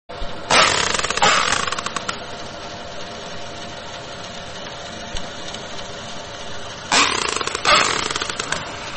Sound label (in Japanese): クリック音